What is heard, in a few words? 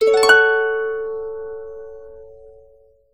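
harp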